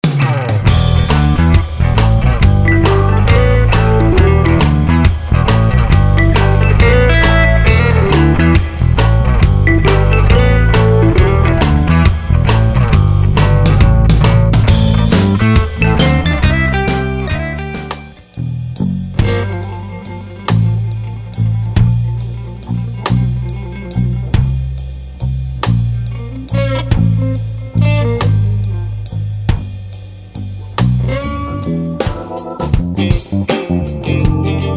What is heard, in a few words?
Blues mix